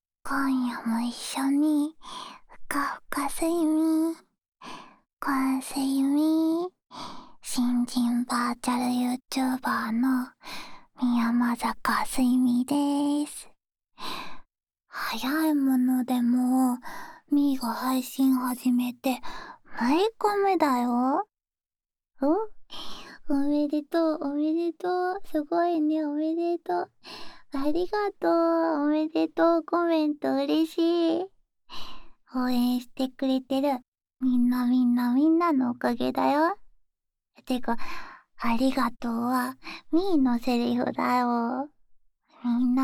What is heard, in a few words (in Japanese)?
焚き火・虫の声・読書; 今夜はゆったり焚き火のそばで読書でも…。